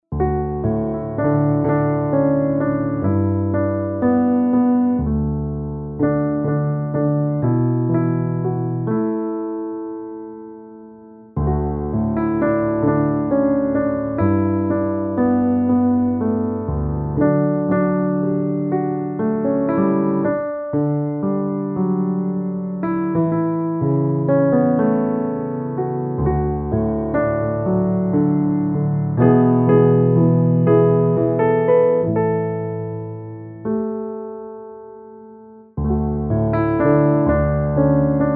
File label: Therapeutic Music Gospel